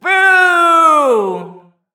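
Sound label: Female Frustrated Shout; Prison Architect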